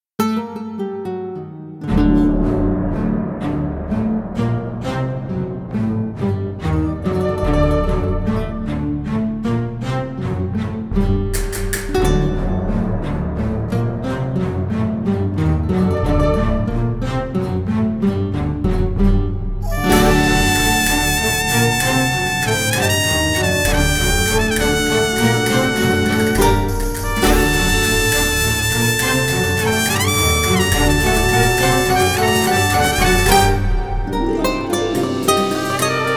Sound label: Medium Spanish Port; pirates of the burning sea game soundtrack 2007